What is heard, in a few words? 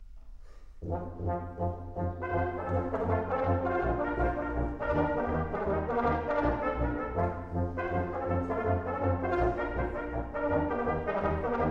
Polka; Schickele